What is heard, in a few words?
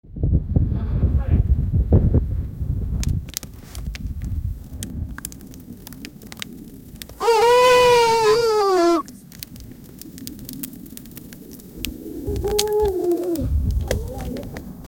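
crackling campfire distant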